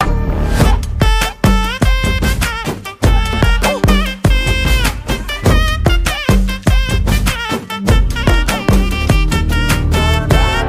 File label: Bgm Ringtone